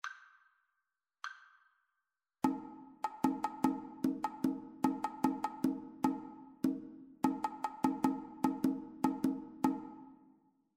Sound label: two part rhythmic dictation